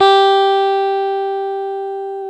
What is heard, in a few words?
GTR, Dual Guitar; Club-50 - Foundations Roland